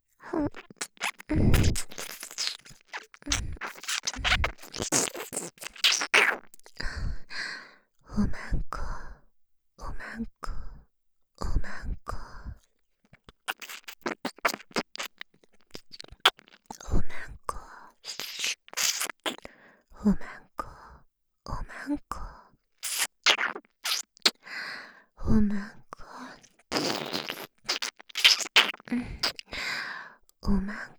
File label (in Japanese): 耳舐め; 左右反転差分; 淫語ヘヴン